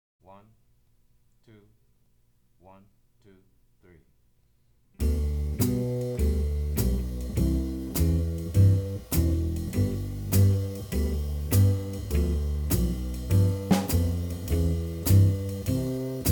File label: g blues